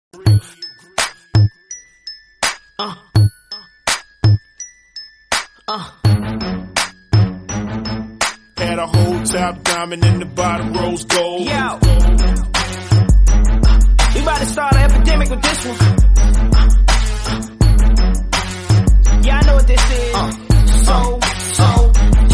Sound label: club beats